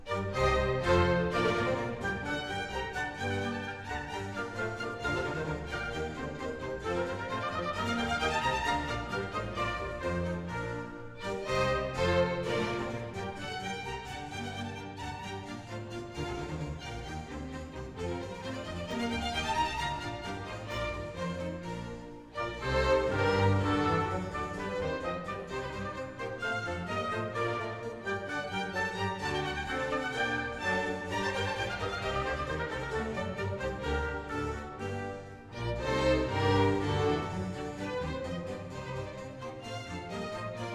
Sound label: Water Music Suite in G Major; Handel; Fireworks Music & Water Music